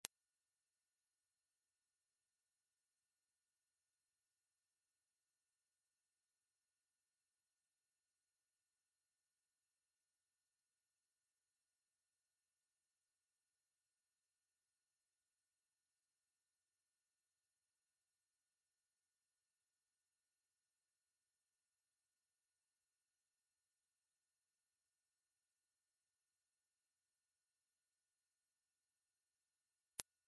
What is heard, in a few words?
mosquito repellent ultra sound